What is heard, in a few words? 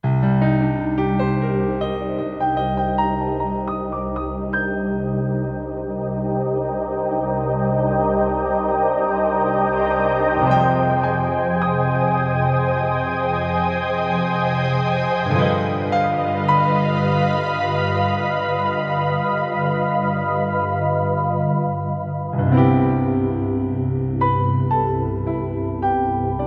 CFX and Pad